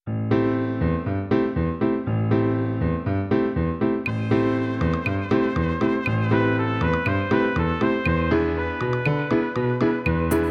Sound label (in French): tango, version instrumentale